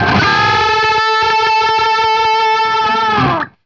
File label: DOUBLE A 3, guitar; East West; Steve Stevens Guitar Samples Collection; DOUBLES